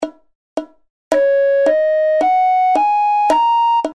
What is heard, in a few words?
recorder notes